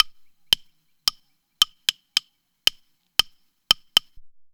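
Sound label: WOODSTICKS GROOVE; Best Service; World Colours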